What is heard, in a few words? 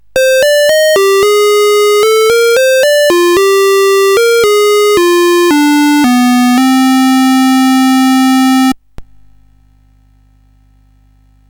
Dragonworld sid CSG8580R5